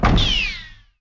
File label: laser